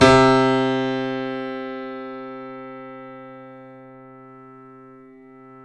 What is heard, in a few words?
C7; East West; The Ultimate Piano Collection